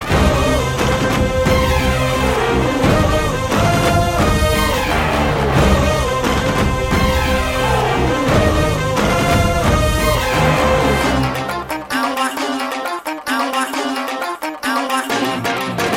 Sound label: Mass BGM; Etharkkum Thunindhavan